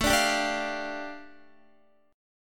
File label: A 7th Suspended 4th Sharp 5th A Guitar, chord; strummed